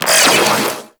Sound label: Proc Magnetic; Warframe; Mods